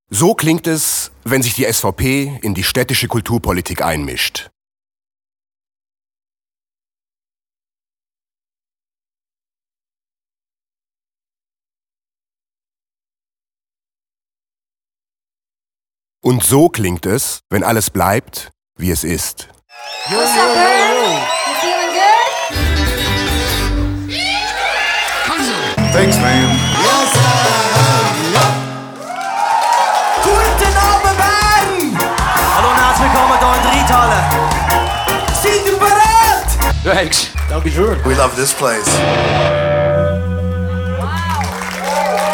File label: jingle; reitschule NEIN